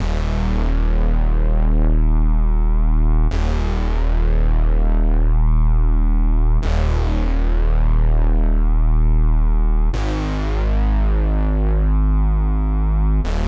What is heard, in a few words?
145 BPM D# Min Bass, Analog; Melodies; Loop Stems; Cymatics; GHOST (Beta Pack); Emerald